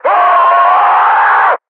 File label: death; custom monsters; mercs